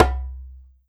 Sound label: Perc Djembe Bass; Circ Afrique V4